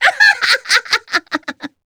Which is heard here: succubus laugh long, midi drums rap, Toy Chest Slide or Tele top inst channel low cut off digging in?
succubus laugh long